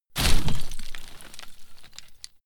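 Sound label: step gravel step, footsteps; schritte; menschen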